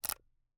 Camera, Kinusa K77, 50mm lens, plastic, serrated, lens adjust; Eiravaein Works; Vaeyan IV